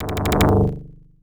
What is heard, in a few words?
Drumroll End; Great Circus Mystery The Genesis